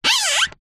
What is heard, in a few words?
string rub wood squeak; foley